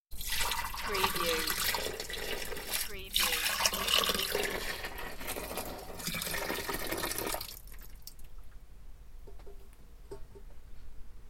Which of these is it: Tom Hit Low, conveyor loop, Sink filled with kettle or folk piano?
Sink filled with kettle